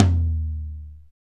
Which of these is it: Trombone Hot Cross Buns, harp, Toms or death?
Toms